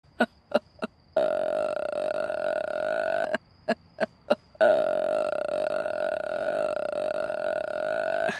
laughter; Gumball

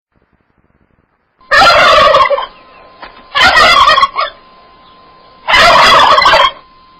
Turkey Sound

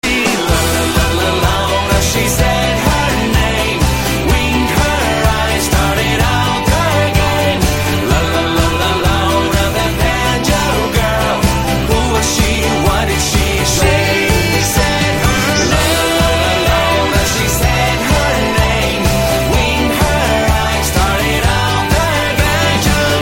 Banjo

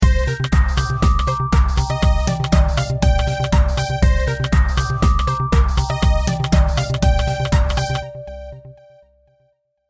GarageBand Ringtone

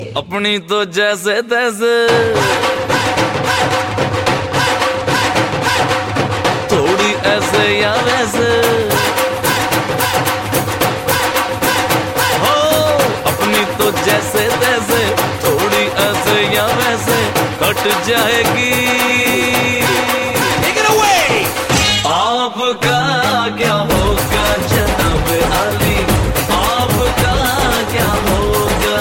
Tabla

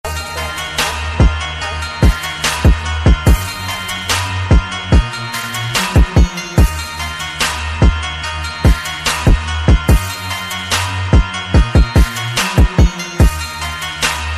Arabic trap; x-ray

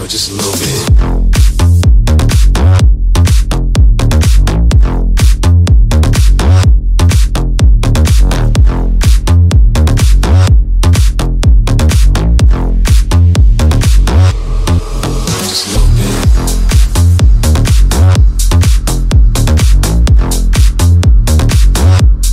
Bass boosted

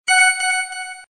Short Sms

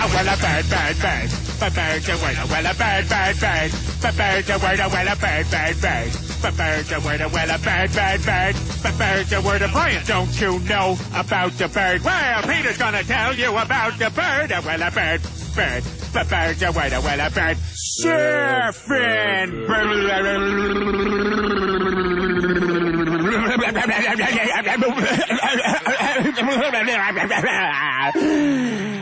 Bird; Fam Guy

